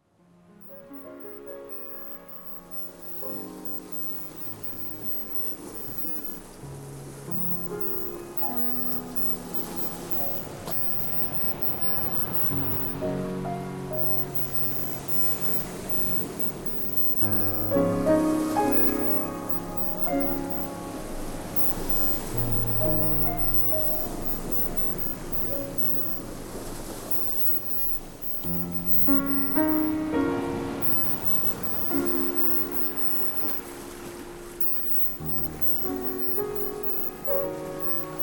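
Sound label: twilight healing sea subliminal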